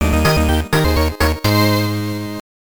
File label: Level Start; panic bomber virtual boy